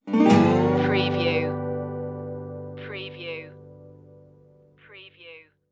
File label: belly up blues stinger